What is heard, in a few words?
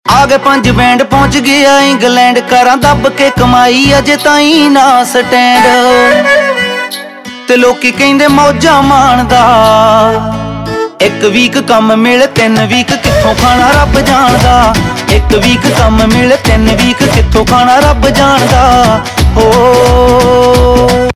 Ringtone, Punjabi